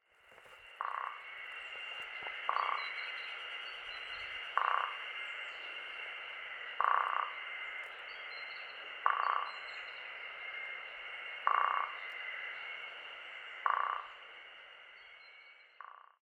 kogera; koe; chichibu

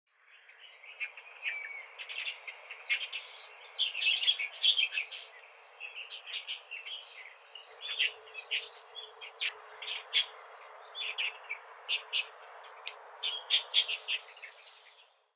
shirogashira, okinawa

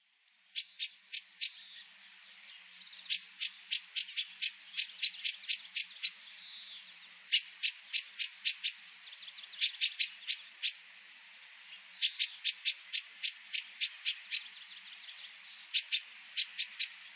tsugumi; toyota

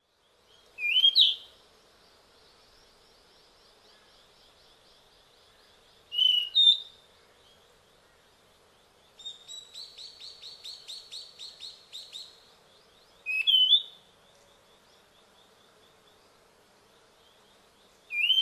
ryuukyuukibitaki; okinawa

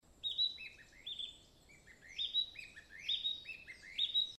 kibitaki kojukei; mane

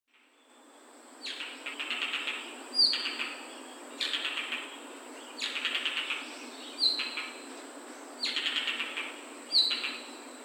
isohiyo, itoman, koe

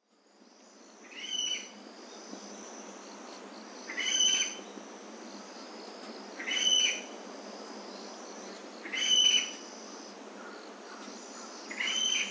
yanbaru, kunigami; ヤンバルクイナ; 地鳴き; 沖縄県国頭

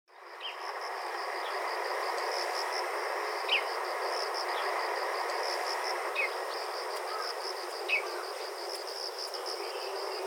shirogashira, itoman

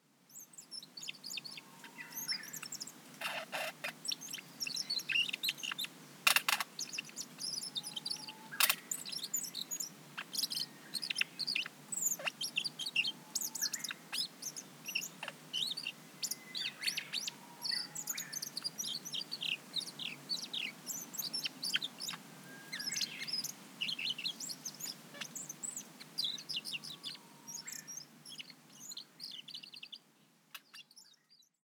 koruri, nagasaki, koe

